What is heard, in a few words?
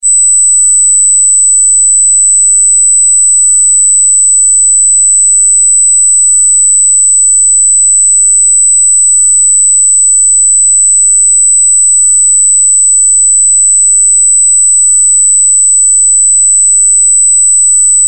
high frequency